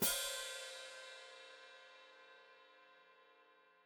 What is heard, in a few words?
Hat Closed Open, Hi-Hat; Deeply Sampled Drums; Cymbals Stereo